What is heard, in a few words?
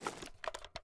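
raise first movement, weapons; gmod; viper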